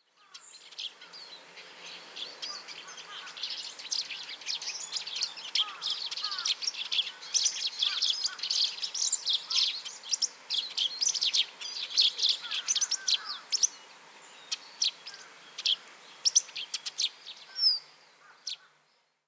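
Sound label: Russet Sparrow; hyogo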